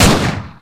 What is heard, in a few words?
pistol fire; svencoop